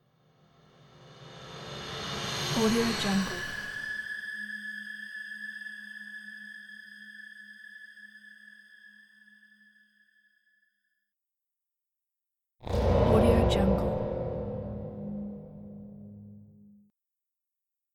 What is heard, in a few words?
sci fi movie atmosphere